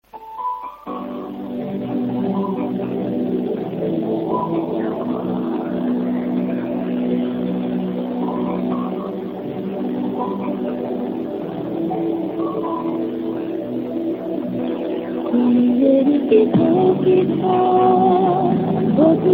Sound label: music; キャリア別通話音質